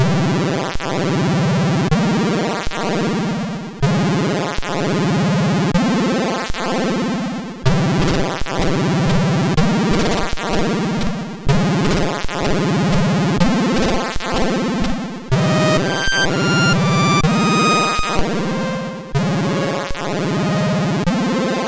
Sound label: sid MOS6581R2